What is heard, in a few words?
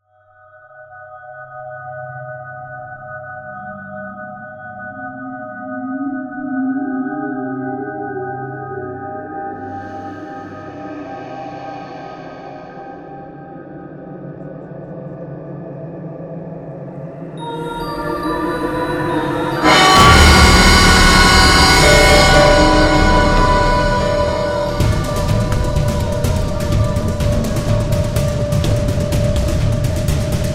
Drop, Tension